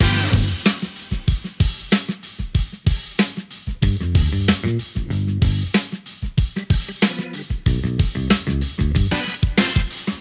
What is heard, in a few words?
Funk; Sans HHHusher